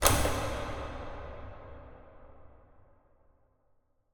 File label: industrial light switch on large empty warehouse reverberant